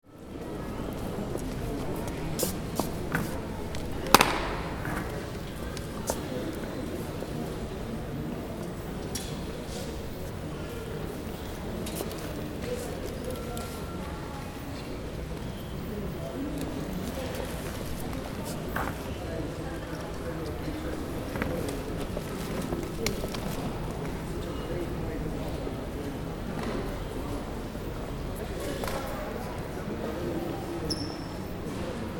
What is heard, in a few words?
Police station ambience interior department quiet